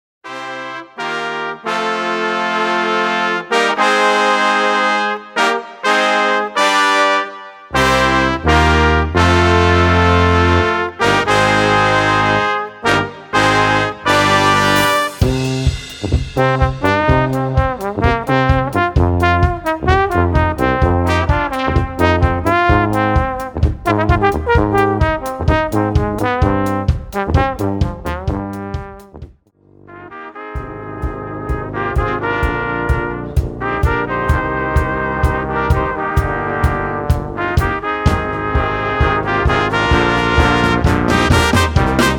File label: Brass